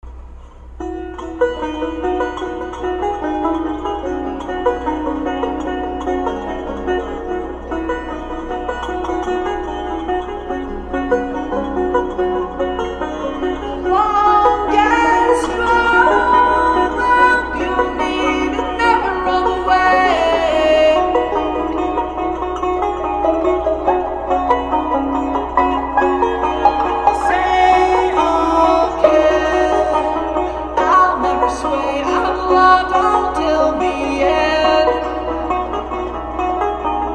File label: waltz, banjo